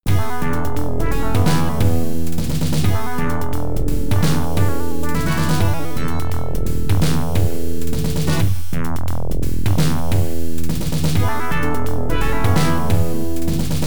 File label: Main Theme, pinball; Cannon 1 Loaded